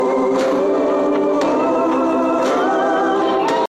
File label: Notify